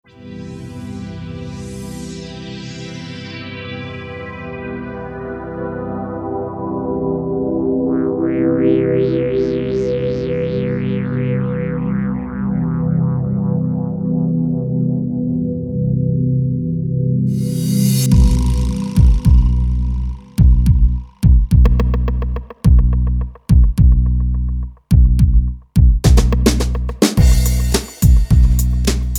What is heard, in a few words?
Deep Guitar Mix